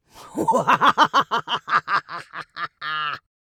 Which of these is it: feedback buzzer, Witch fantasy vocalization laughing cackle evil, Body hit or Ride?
Witch fantasy vocalization laughing cackle evil